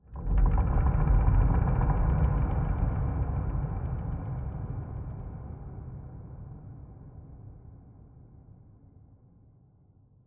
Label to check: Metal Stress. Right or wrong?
Right